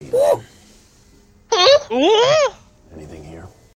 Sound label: woo trio